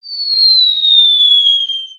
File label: Spider Boss Fire Rain Whistle; creatures mechanical